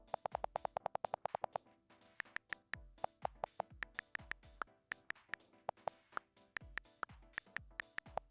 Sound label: ios 10 beta 4 keyboard sounds